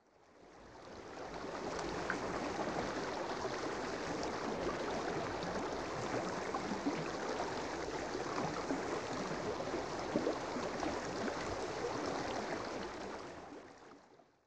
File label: river turbulent atmo